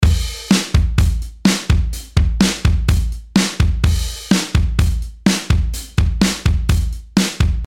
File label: Drums Dry